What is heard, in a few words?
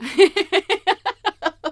Laugh; TR3